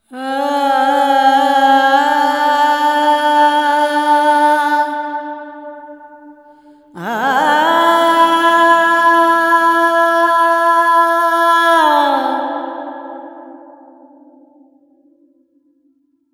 Vocals Male, World Pop

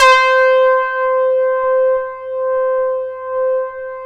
MATRIX 12, OB Synths; E-MU Formula 4000 Series Vol. 3; Analog Odyssey

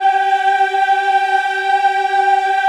G4, Choir; USB Soundscan vol.28; Acoustic & Synth; AKAI

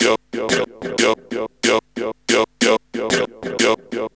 VOC LP; USB Soundscan vol.34; AKAI; TIMBA

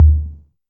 TR808 Tom, Roland SH-32 Drumset

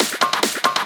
Fills, Loops 140 BPM

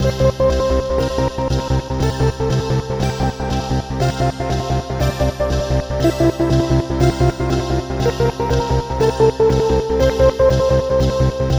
Synth Pattern; Patterns Progressions; Electrocution

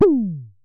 Synare Filter; Star Instruments Synare 3

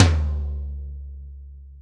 TOM-TOM-L, ACOUSTIC KIT; Greytsounds VOL-1

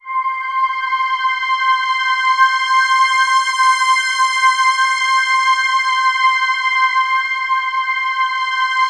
C6, Synth Leads; 90 s Sample CDs; NiceBeats (NiceBytes); Studioline 09

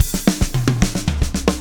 POWR, Backbeat, SHUF

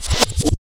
static cut, drum loops; Zero-G Jungle Warfare 1, 2 & 3

